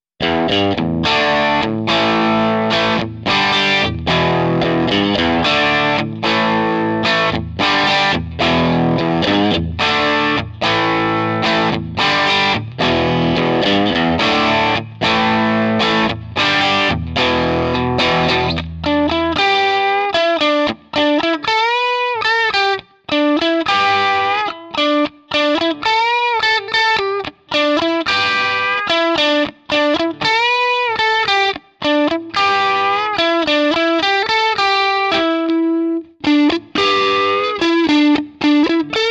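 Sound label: Strat HB Treble DigiTech RP70 Marshall JCM800; Gitarrenvergleich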